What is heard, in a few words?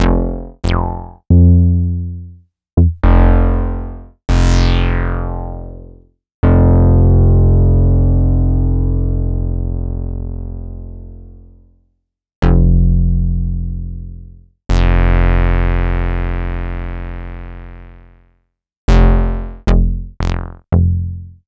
Moog Minimoog Bass